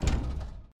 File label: block Break; Mindustry